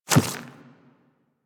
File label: Infested Small Walk Dirt, Footsteps; Warframe